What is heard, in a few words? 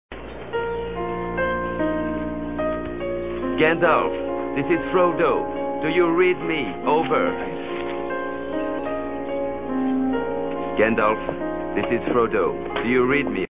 ringtone; gandalf frodo